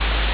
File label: ambience room tone